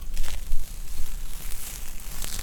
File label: Stick Though Spider Web